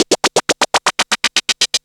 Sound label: Rise FX; Zero-G Jungle Warfare 1, 2 & 3; 90 s Sample CDs